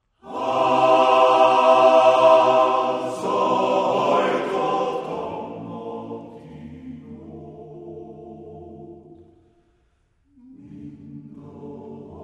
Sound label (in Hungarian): Magyar nepdal